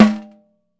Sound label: LOW RATTLE, X-STICKS; Sampleheads; New York City Drumworks VOL-1; KD X-STICKS